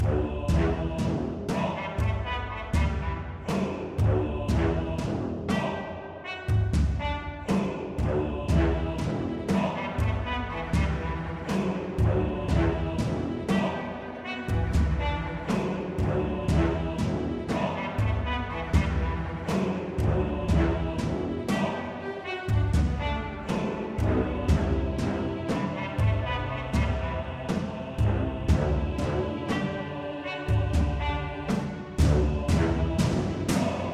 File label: town dwarf, music; thoalap